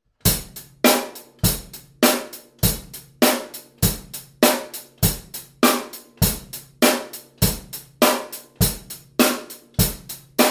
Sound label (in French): rythmes incontournable, batteur